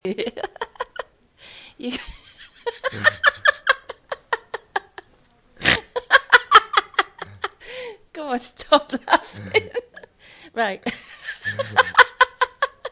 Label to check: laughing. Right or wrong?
Right